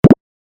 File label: move